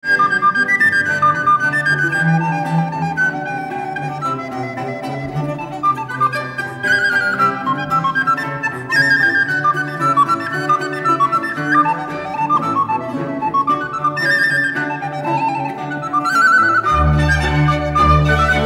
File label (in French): concerto pour flute, allegro, porte d oiseaux; VIVALDI; rv443